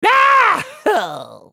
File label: Twitch dying